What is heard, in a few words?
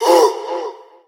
ooh, Voice